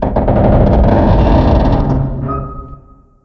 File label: fire groan, ambient